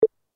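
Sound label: button player control minus; poker game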